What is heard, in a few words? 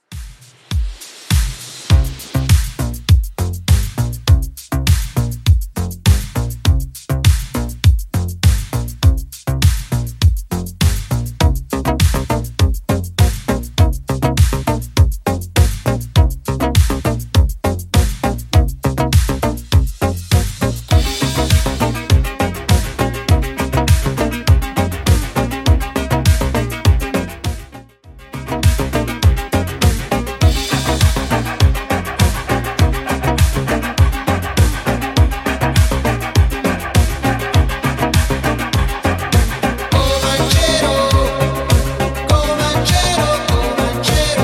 80s Redrum 101 bpm